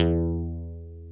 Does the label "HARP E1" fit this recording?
Yes